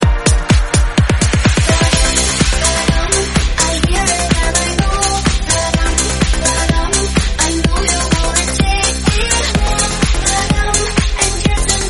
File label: 126bpm Cm